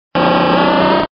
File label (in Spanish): Grito; Beedrill